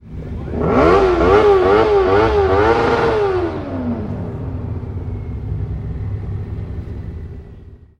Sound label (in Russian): avtomobil krutit dvigatel na stoyanke